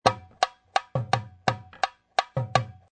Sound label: 85 bpm, World